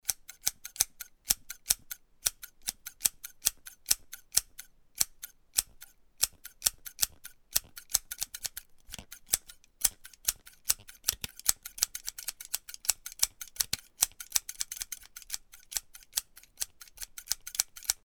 Tiếng cắt tóc

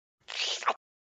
Tiếng liếm miệng liếm môi

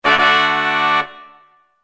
Am thanh ta da

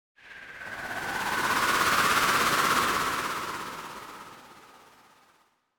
Tieng vat the bay xoay tu tu bien mat

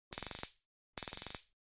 Tieng phong dien dui cui dien

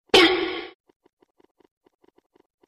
tiếng uynh

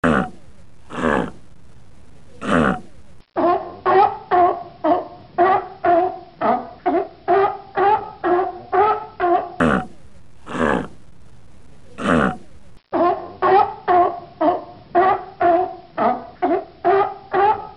tieng su tu bien keu

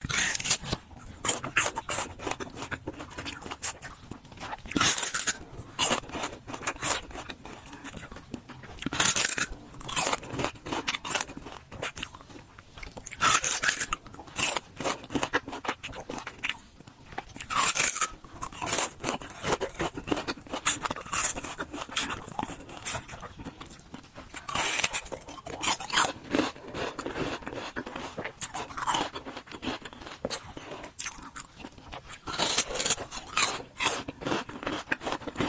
tieng an mia nhai mia nhop nhep

